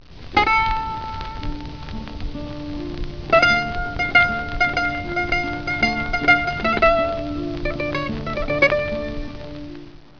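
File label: bouzouki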